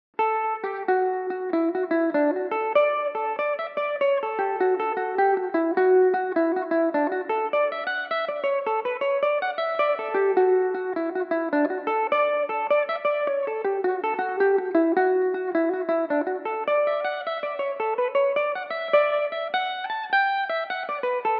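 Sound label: jig D major belmuse, irish mandolin; Paddy Taylor's Jig; Belmuse EM-190 electric mandolin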